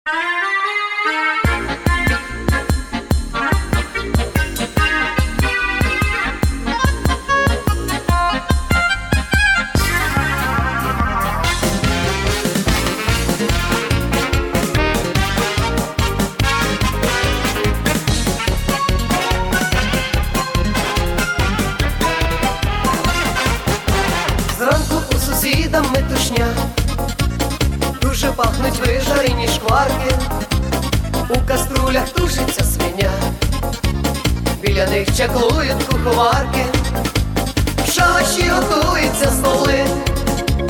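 Ukrainskie svadebnye pesni, Baraban i dva bayana, Ukrainian wedding songs, drum and two button accordions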